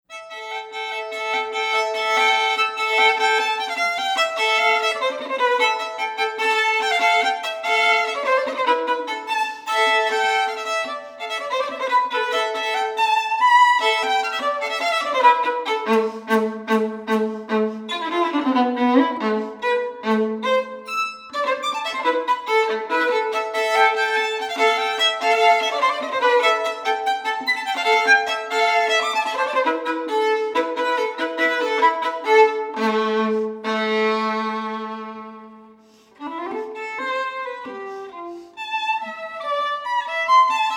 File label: Partita for Unaccompanied Violin